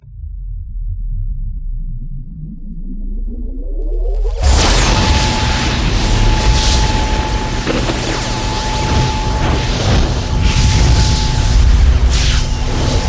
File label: Volcano Cannon, Sound Effects; Warhammer 40000; Tabletop; munitorum Volume I Sound Effects